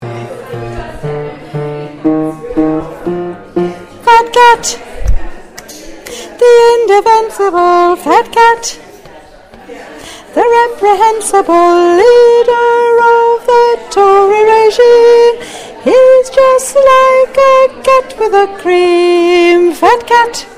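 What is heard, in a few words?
Soprano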